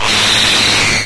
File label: pain, headcrab; mistake coop